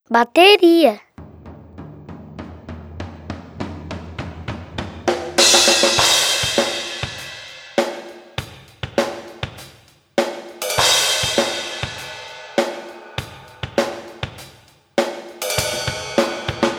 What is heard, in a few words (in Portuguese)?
BATERIA